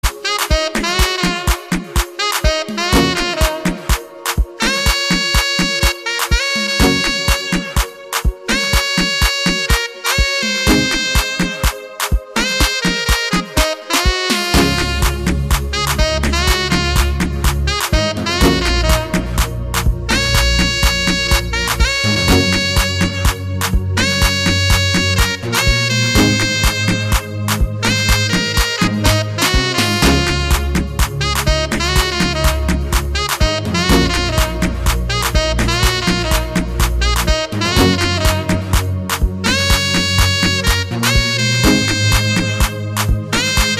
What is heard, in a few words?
Saxophone